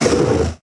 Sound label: stomper death big, enemies; Factorio